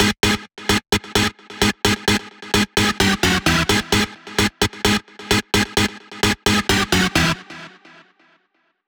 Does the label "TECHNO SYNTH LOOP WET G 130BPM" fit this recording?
Yes